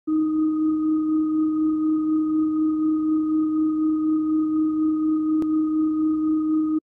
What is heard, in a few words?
car tesla reverse; mace